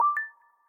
nfc start, samsung touchwiz

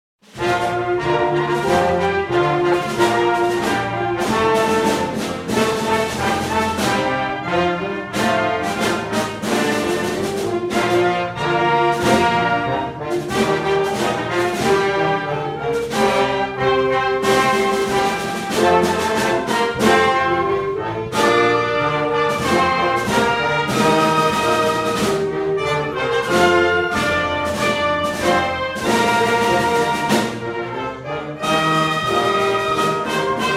anthem

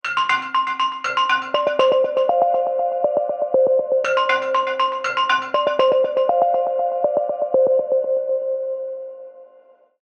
lithophone; vsmart joy 4

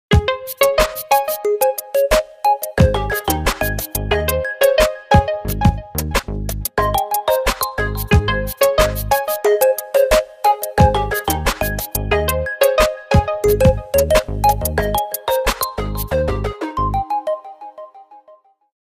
affability rnb

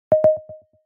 galaxy watch low battery

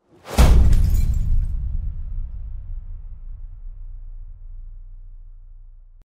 impact

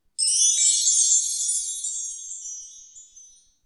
star chime, BlackBerry Bold 9700

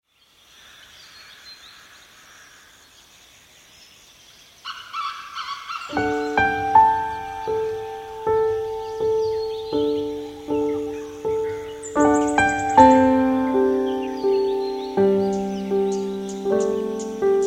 chilled morning, zvuki telefona micromax

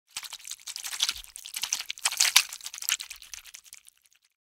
fish wet

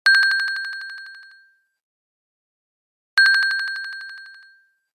alarm timer; apple watchos 11

